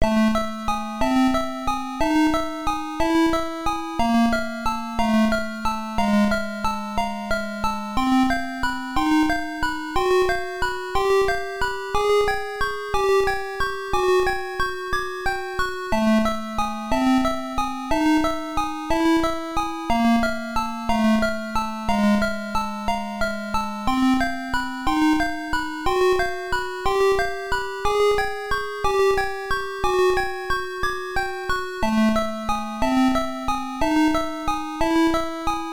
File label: BGM [PSG]; Golvellius; MSX